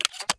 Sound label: nova insert shell, weapons; Counter Strike Model Pack; CS2